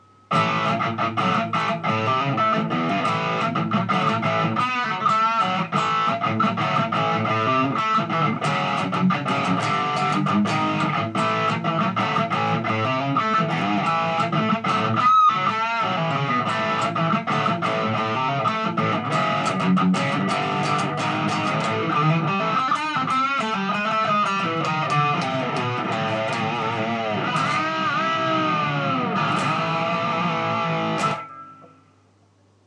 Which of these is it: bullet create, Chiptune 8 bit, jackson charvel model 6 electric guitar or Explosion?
jackson charvel model 6 electric guitar